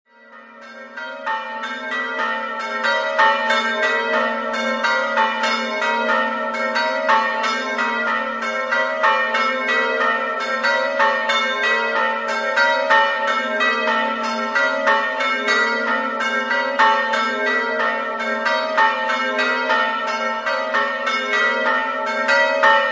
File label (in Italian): gjavons, campane